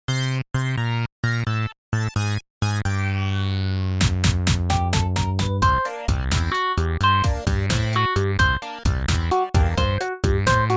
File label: MIDI blues